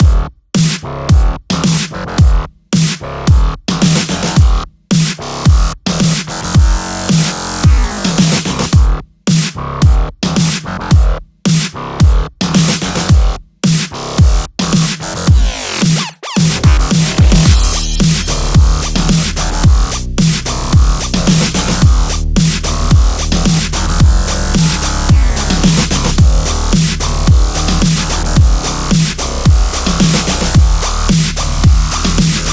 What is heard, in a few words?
Raw Metal